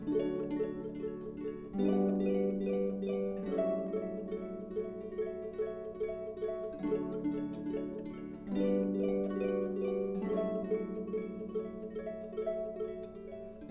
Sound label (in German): Harfe 70 bpm